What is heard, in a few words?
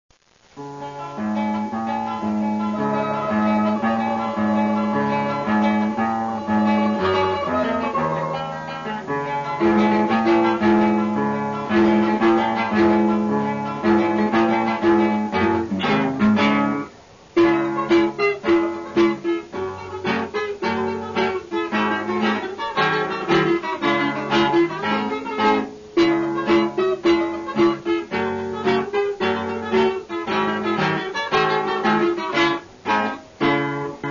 sirtaki